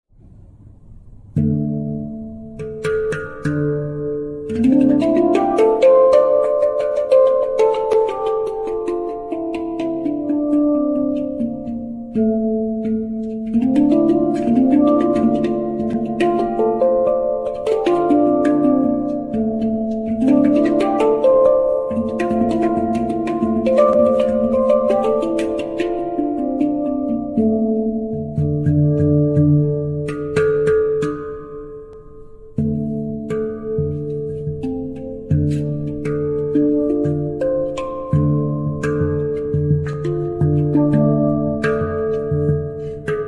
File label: handpan 11 notes